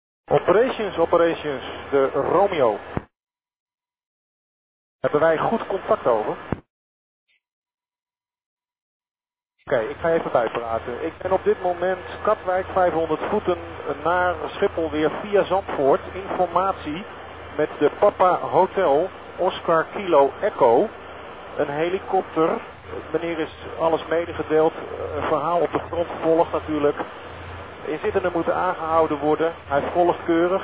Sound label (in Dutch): heli boven Wassenaar; Scanners